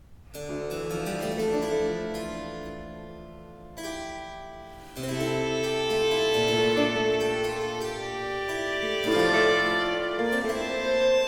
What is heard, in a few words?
Sonate 10 in G major 2. Grave; Arcangelo Corelli; Corelli - Complete Works; Klassika